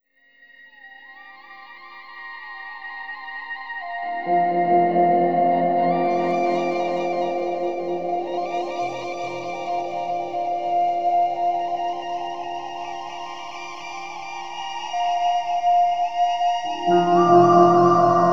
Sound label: Dirty Flute Backdrop; Piano; Sonic Foundry (Sony Creative Software); Slow Silhouette